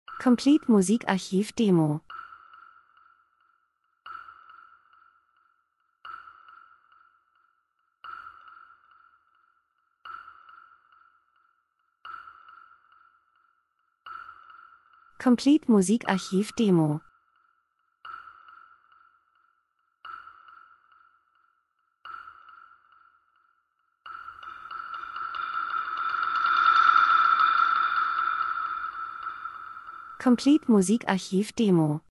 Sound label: drums; Rimshot Spannung Allein Einsamkeit Weite Menschen Zeitgeschehen Maschine Dokumentation Industrie